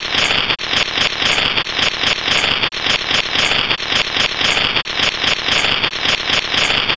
skulltula move, enemies; zelda

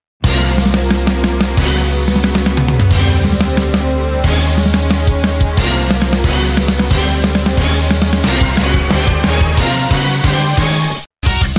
boss bgm lead; svencontra2

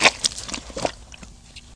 flesh, debris; svencoop; echoes

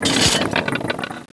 dropoff; svencoop; commandandconquer

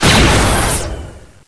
shot; svencontra2

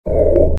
pit drone hunt; svencoop; legends

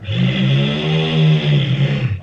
gonome moan; svencoop; lostmaze

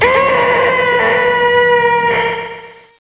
tentacle move; svencoop; cracklife

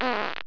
zap, debris; svencoop; cracklife

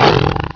pain, fiend, monsters; svencoop; quake1

